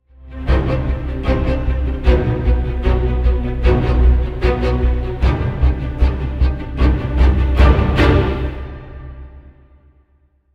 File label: BGM Jingle Rescue; crimesight